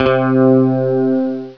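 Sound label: electric guitar C3; Star Trek JR Audio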